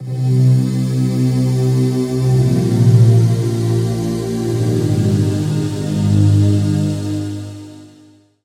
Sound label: pad; Dense Fog; Routed Sound; Serum 2 Expansion - Flame Summoner Vol.1